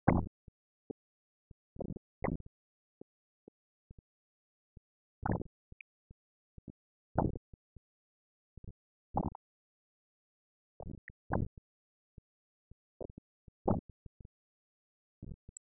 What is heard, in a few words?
piano 2 layers